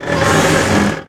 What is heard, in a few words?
Creak Metal Tower Fall; SFX Kit; Creaks, Scrapes, & Misc